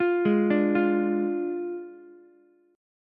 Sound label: F Minor 6th, Ukulele; Fm6 arpeggiated; 10,8,10,8; JGuitar